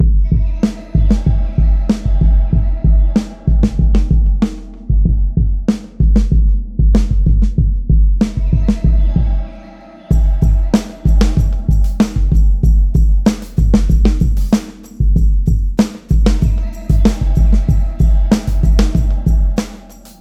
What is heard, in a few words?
DRUM